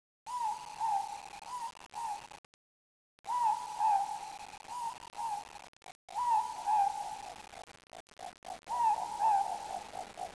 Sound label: morepork, New Zealand birds